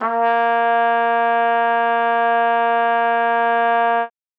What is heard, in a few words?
trumpet a#3, Trumpet mezzoforte; Session Instruments